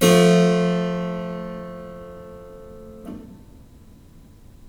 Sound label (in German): gr Terz